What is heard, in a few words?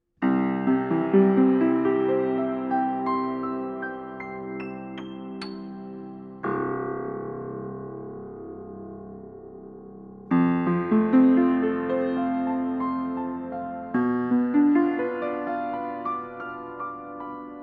Audio Technica 803 Piano Mic Outside Wall